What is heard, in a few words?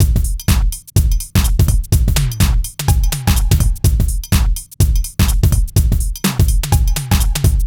Full Loop 125, Loungin House; Equipped Music